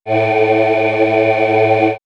Swirls, pads; X-Static Goldmine

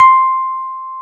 Electric Pianos; Wizoo Electric Pianos

